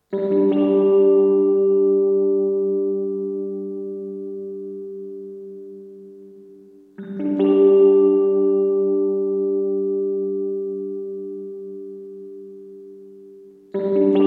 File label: Echo Fix EF X2 Mode 2 Blend Reverb